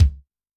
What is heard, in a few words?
So Tight, Yamaha DTXpress-II Kick